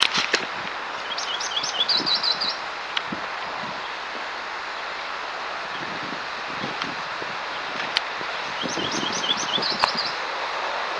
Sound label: bird